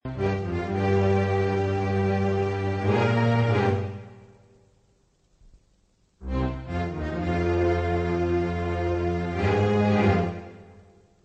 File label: instrumental